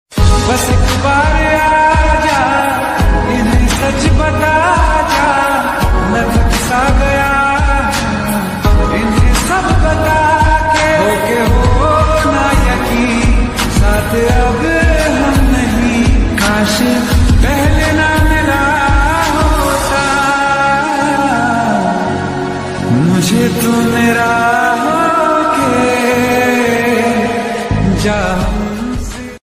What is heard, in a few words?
new ringtone punjabi ringtone hindi song ringtone love ringtones mobile ringtone